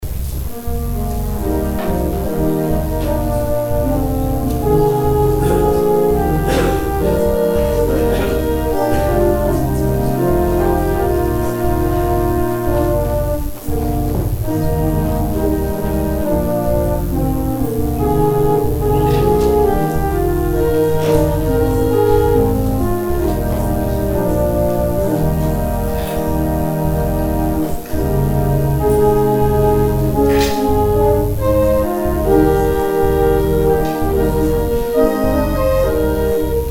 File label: organ interlude; played during the offering